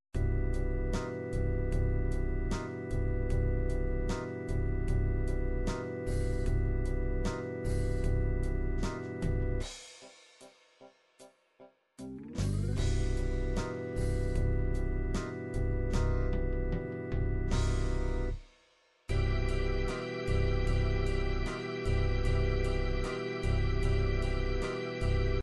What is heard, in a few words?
ballad organ ballad